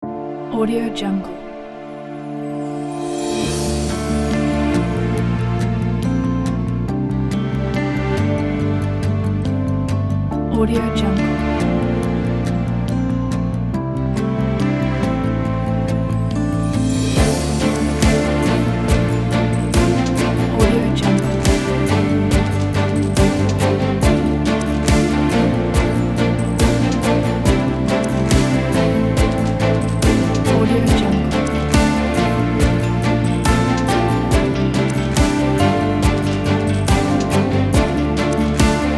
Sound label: cinematic ambient kit